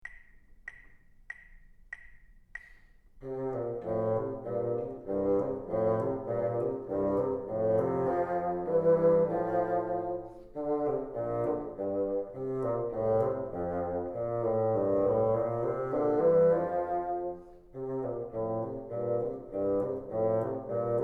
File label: together, bassoon